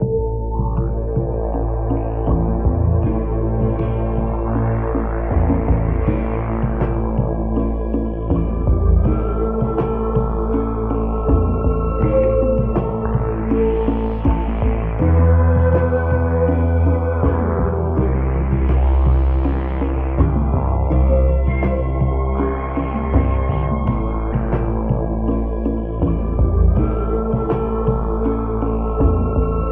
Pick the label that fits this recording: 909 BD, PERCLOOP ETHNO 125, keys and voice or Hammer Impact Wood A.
keys and voice